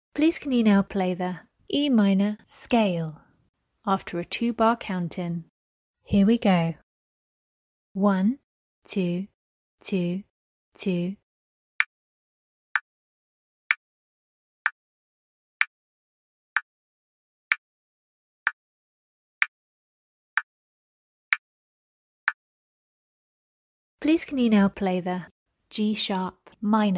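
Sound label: E minor scale G sharp minor scale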